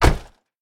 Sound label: car door close; factorio; Factorio 0.14.21